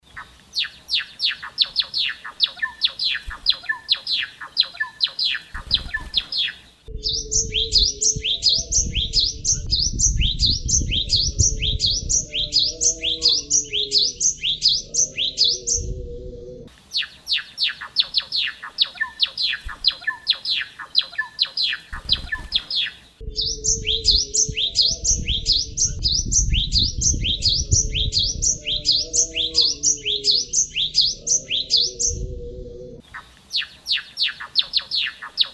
Suara Burung Gelatik Batu Gacor; Full Isian

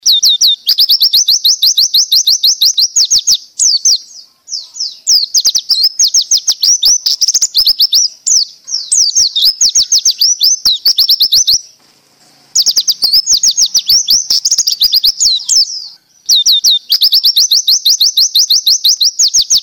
Suara Burung Pleci Gacor Ngerol Panjang

